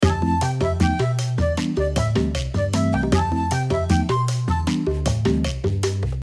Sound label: Short mistery